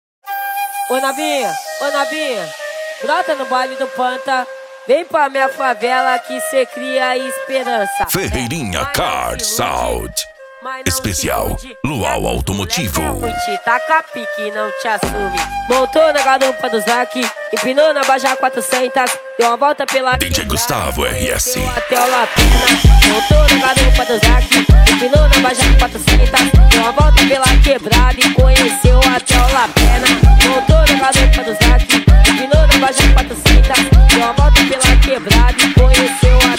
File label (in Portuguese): lual automotivo; ferreirinha car sound